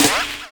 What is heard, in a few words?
RAIN BEAT; Univers Sons - Kitch 70s